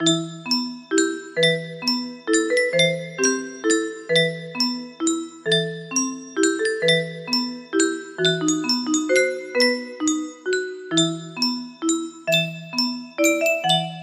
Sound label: fable ii music box